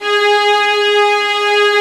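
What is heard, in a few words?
Vio legato G#3; Digital Sound Factory Virtuoso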